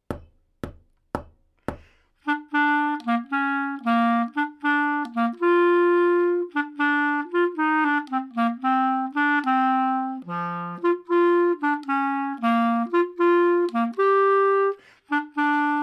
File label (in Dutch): Swingmars bovenstem; Horen Lezen en Spelen deel 1